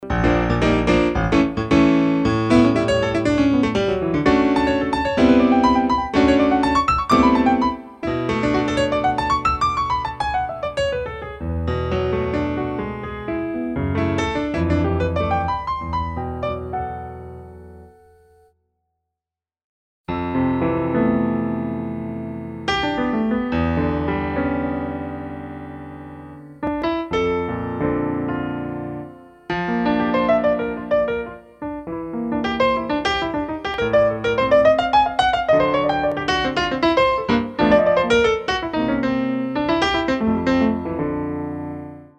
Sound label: piano; IK; iRig; SampleTank iOS